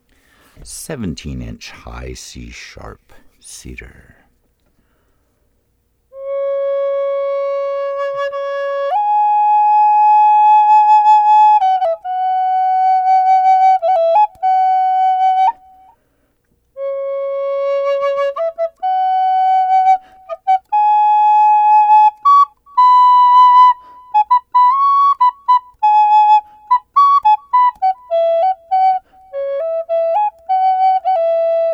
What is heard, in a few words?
Cedar; 17inch High C# Cedar; 18" High C# 432 or 440Hz; 5 hole; Birthed in Beautiful Cedar